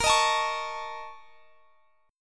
Bb Minor Major 7th Double Flat 5th, Guitar, Standard; x,x,20,20,22,21; strummed; chord shape